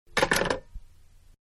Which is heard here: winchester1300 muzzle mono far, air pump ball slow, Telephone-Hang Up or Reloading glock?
Telephone-Hang Up